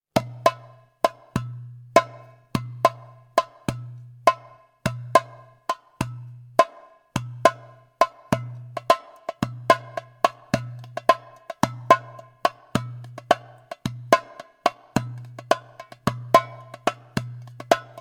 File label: Djembukah solo; Kanun; Arabic rhythm